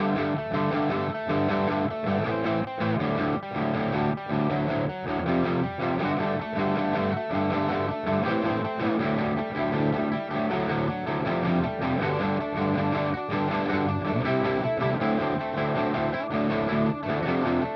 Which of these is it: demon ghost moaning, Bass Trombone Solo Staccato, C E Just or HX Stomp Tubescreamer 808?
HX Stomp Tubescreamer 808